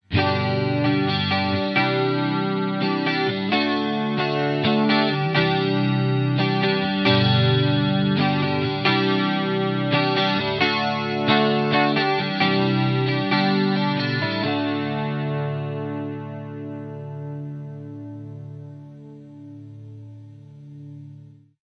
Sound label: chorus; Damage Control TimeLine